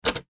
button

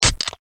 bottle open

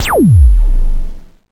LASER

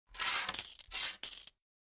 counting coins

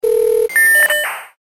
radio

